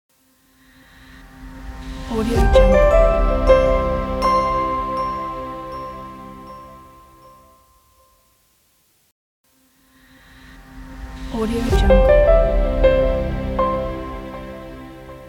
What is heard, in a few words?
bright piano logo